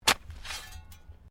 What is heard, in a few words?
Digging Shovel Mulch; Various digging tools and processes; Construction Sound Effects